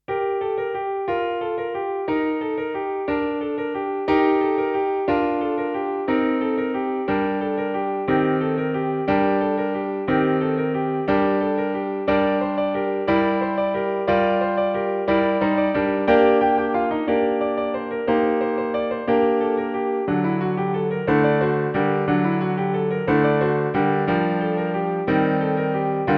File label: grand piano christmas carol; carol of the bells; shchedryk; little swallow; ukrainian new years song